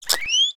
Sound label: Rat Hatchling Squeek; creatures organic